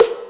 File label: high snare